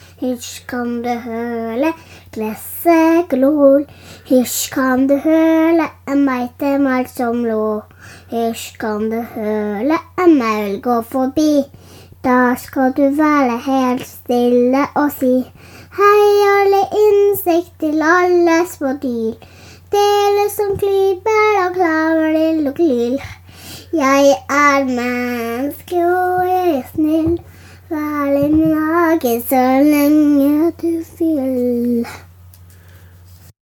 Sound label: Child Lullaby